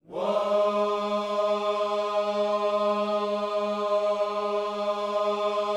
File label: WHOA G#3, Gospel; Spectrasonics Vocal Planet